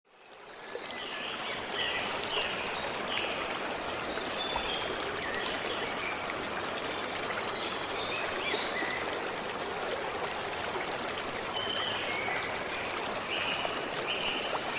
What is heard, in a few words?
Deep rainforest sound